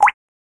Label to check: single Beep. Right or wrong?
Right